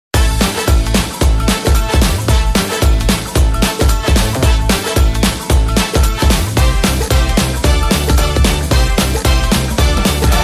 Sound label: Big Band Beats